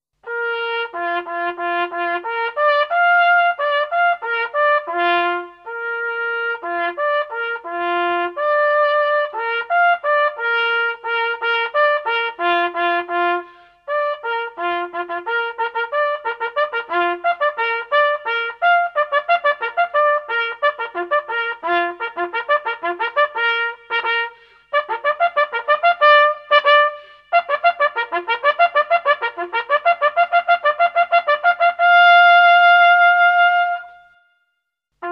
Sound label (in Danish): horn accellerationer